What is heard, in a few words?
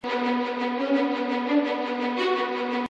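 sounds of violin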